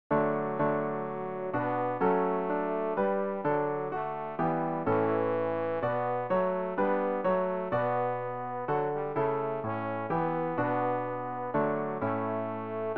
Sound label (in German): tenor; Ref. Gesangbuch; RG 468: Wir wollen alle fröhlich sein